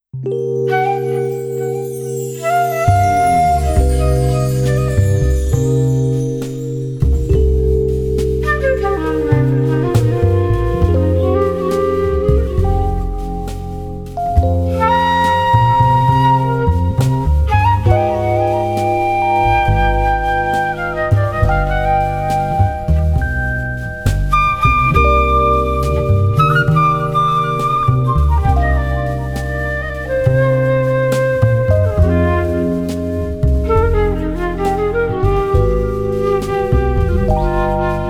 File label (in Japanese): 妄想ジャン Flute Ver.; Shingeki no Kyojin